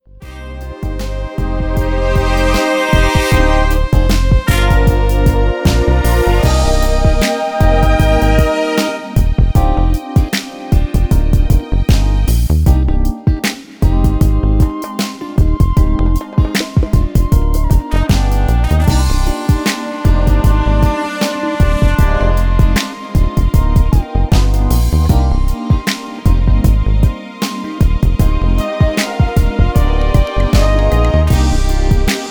Horns Included Key of C Minor

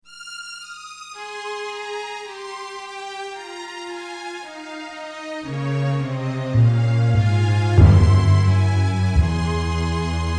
No Sax Key Of Ab, backing tracks

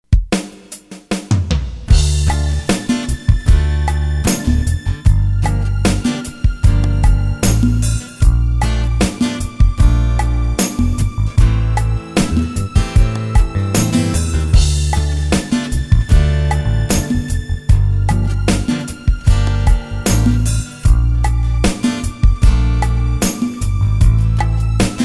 No Sax Key Of D Minor

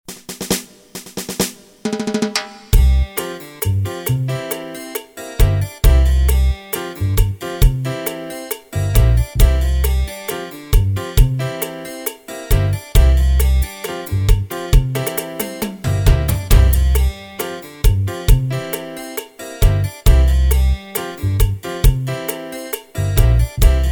No Sax Key Of C

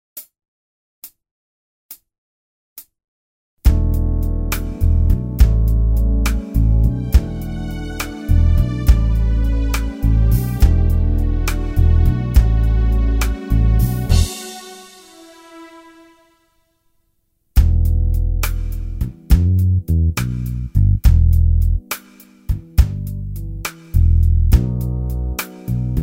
No Sax Key Of E minor

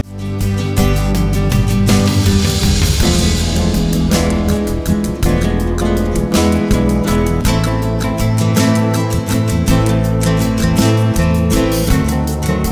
Vocals Included No Lead Guitar Key Of G

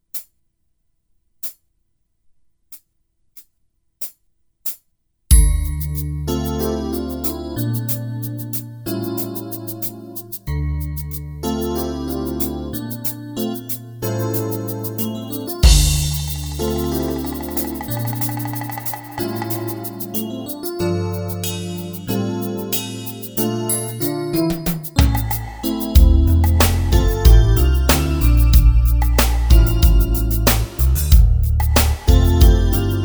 No Sax No Vocals Key Of D minor

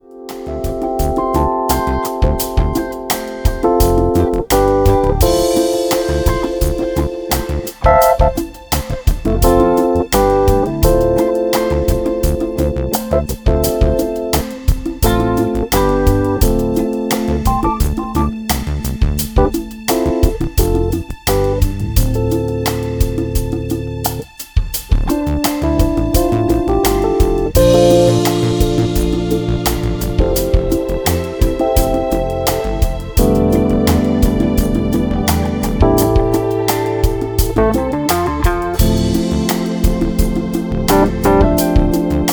No Guitar Solo No Vocal Key Of D Minor

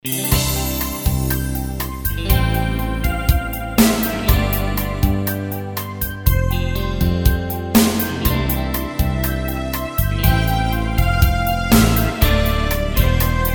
No Sax Key of C

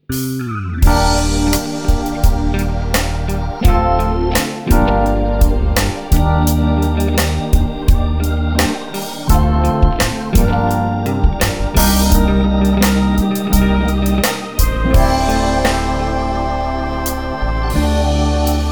No Vocals Key Of Bb

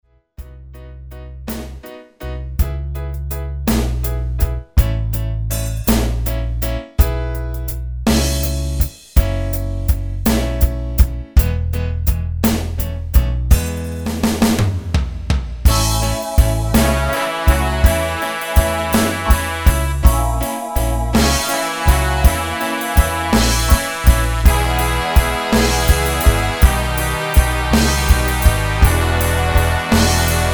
No Guitar Key Of E

